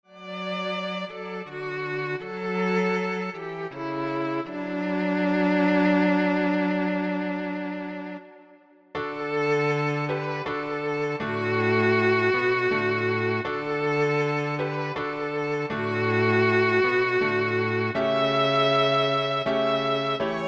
Piano Trio

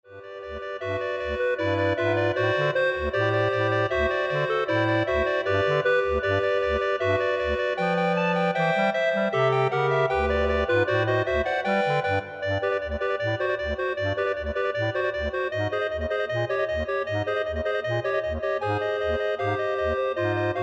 Clarinet Ensemble

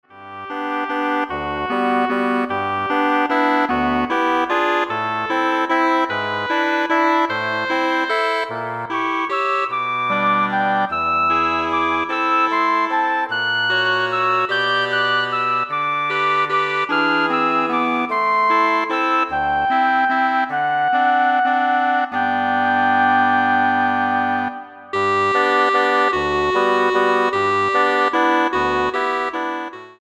Woodwind Ensemble

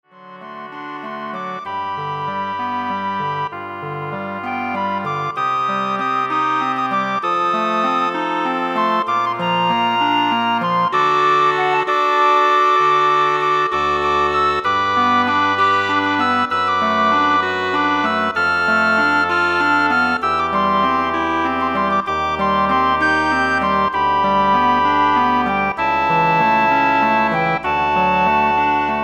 Woodwind Ensemble

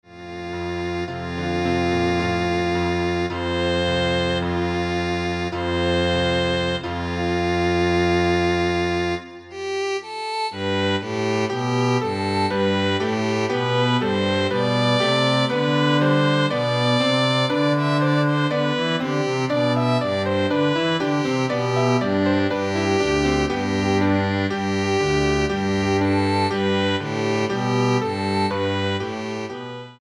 Piano Trio Frere Jacques

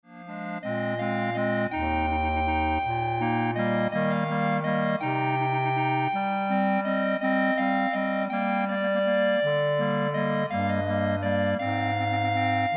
Clarinet Ensemble

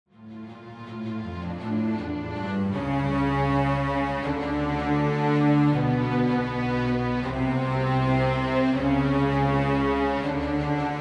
String Orchestra

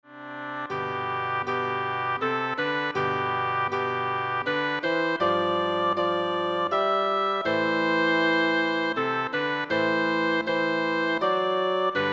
Oboe Bassoon

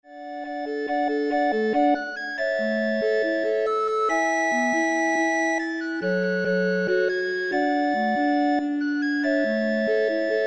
Recorder Ensemble

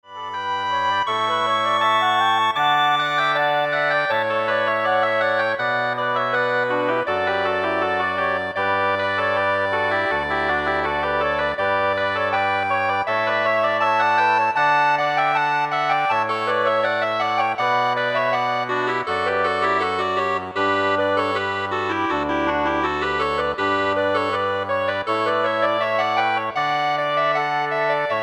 Woodwind Ensemble

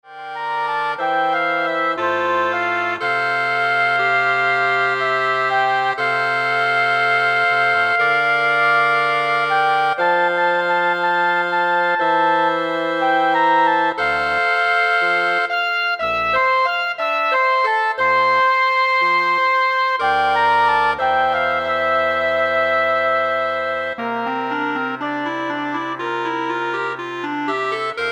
Woodwind Ensemble

